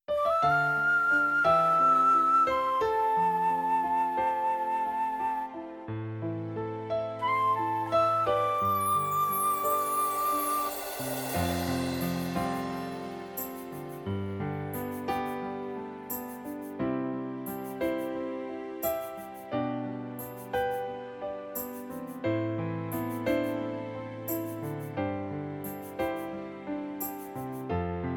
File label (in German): Playback voll; vollinstrumentiertes playback